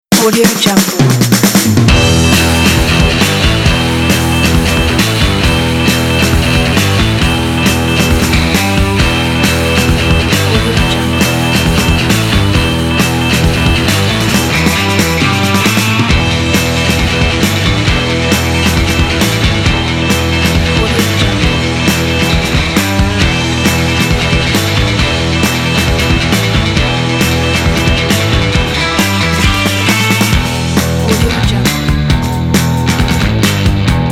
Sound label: chase